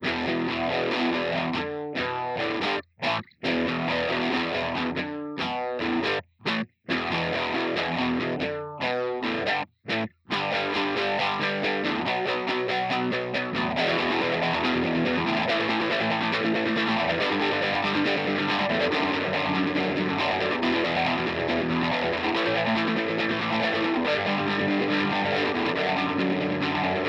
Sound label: Gtr A